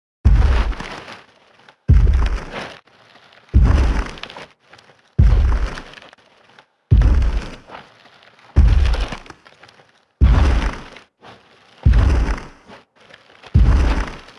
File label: Monster Footsteps On Gravel